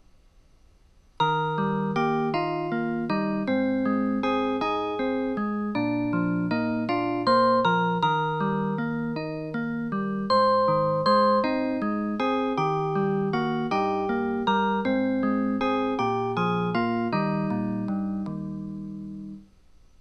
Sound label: school chime